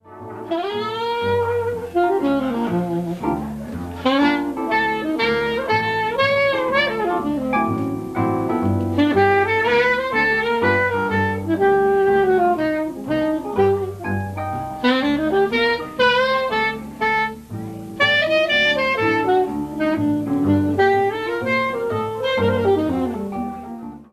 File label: jazz trio piano bass saxophone